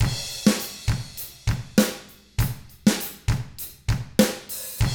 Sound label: ROCK, Drums; ILIO; Double Platinum Drums 1